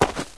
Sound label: softshoe generic, footsteps